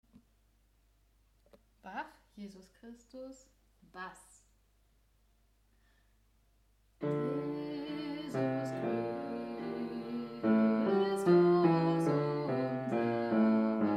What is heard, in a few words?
Bach Jesus Christus Bass; Reformationstag 2025 Kantorei; Übetracks Vergnügt, erlöst, befreit 2025